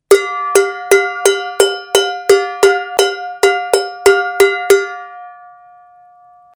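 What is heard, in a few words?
saucepan sound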